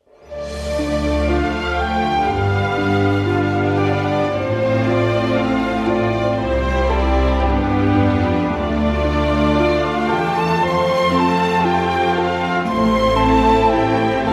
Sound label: Korean orchestra